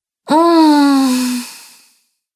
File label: Woman Sensual Moan; Sex Sound Effects; human sound effects